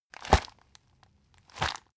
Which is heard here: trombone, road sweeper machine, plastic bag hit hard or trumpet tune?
plastic bag hit hard